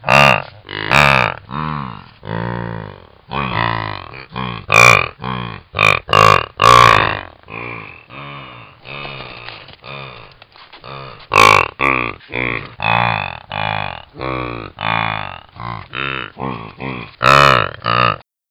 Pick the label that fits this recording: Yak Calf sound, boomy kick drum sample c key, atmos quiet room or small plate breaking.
Yak Calf sound